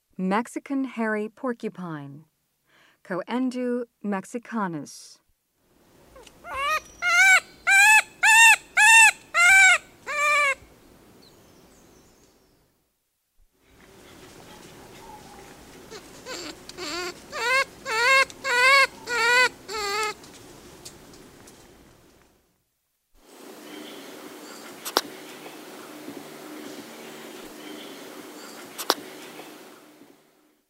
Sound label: Mexican Hairy Porcupine, Mammals of the Rainforest; S. America